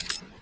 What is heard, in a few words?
zoom